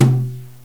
brush tom 4; drums; Software Explosion 2 - Virtual Computer Pets; yamahaxg; gmplay

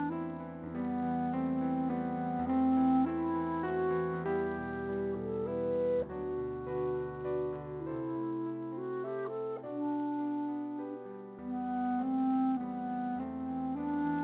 loop

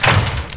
door close

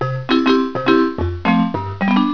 reggae

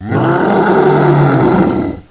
monst

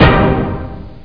Orchestra Attack c; Troubled Souls

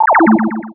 Close Hi-Tech

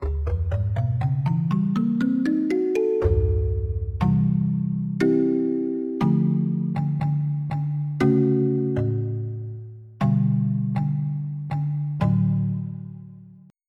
Kalimba; EverQuest

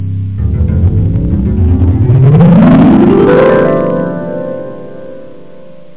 harp

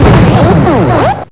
CRACK UP; Graliens

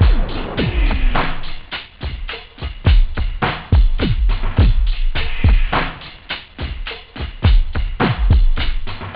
break, house; OctaMED SoundStudio V1

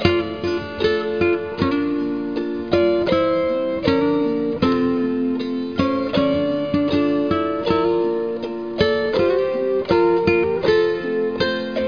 harp

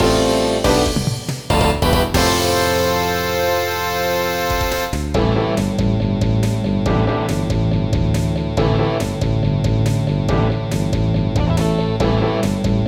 game over; gigaline

